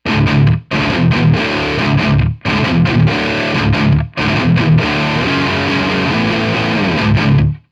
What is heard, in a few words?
Marshall JCM800 4x12; Metal